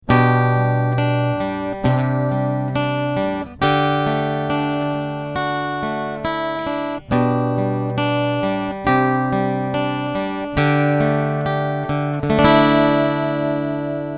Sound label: picking tri Axis